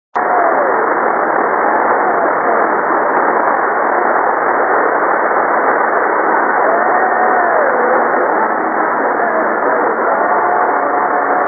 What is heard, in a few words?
radio khz; mac radio usa